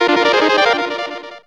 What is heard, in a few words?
SE GAME BREAK; maimai